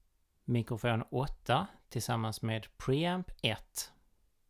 mic pre

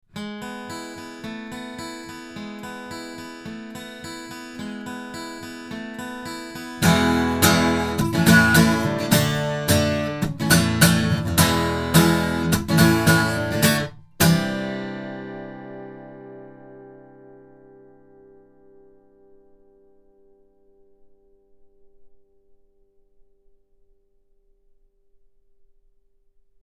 Avant CV-12 tube omni guitar

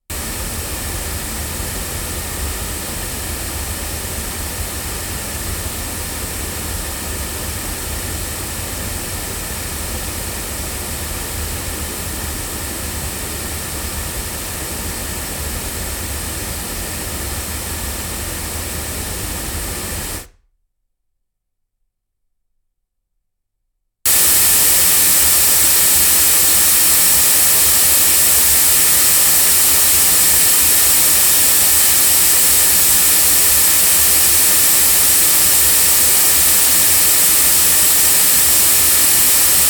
cardioid loudspeakers

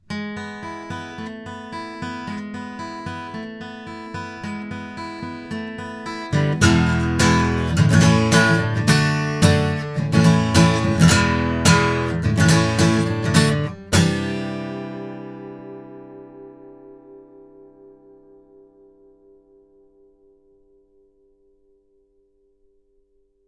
guitar; Milab DC-96C; microphones

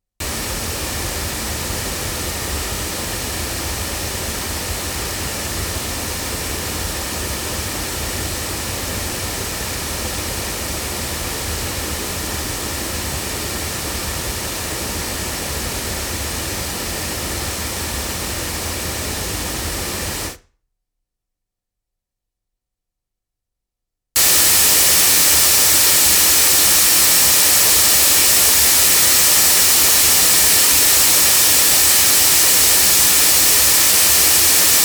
Rode NT3 battery loudspeakers

